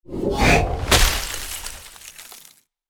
Ice magic spell large impact; fantasy

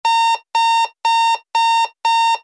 Digital alarm clock beep

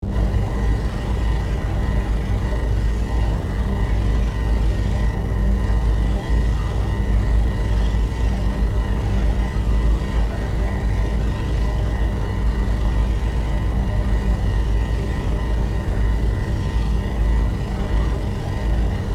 Force field manipulation energy field

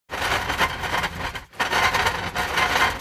Metal trash can dragging sliding scraping

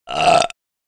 Disgusting meme burp; social media sound effects

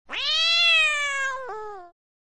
Angry cat meowing

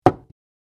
Small wooden box container close

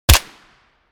M1911 pistol single shot close perspective

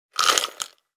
Putting a jewel in the jewelry box

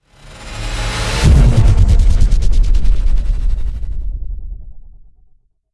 Subwoofer bass impact cinematic trailer

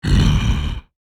Werewolf angry grunt

